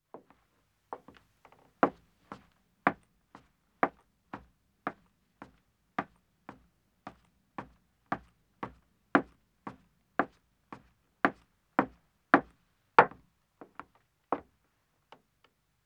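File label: Wooden Stairs Ascending, 1 Woman, Footsteps; BBC Sound Effects Library; Footsteps II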